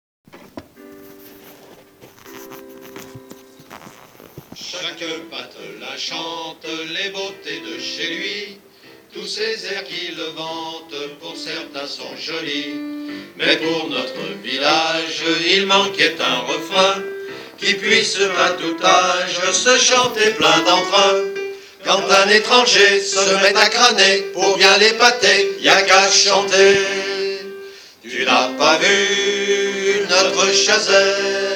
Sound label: Vocal